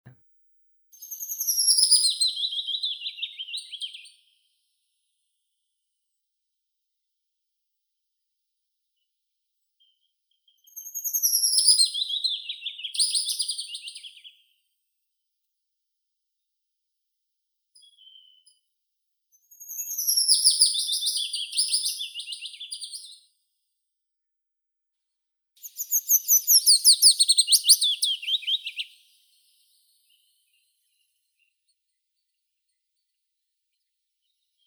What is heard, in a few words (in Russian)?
penochka vesnichka phylloscopus trochilus